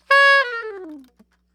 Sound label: SOP SHRT C 5, saxophones, SHORT FALLS; Greytsounds - Philip Wolfe David Crozier's Saxophones